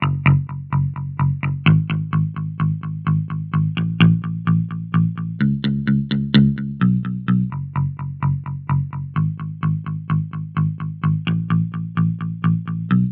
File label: E-Guitar Chorus, 128 BPM; Vengeance Samples; VTDS2 Song Kits